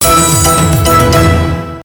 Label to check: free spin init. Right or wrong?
Right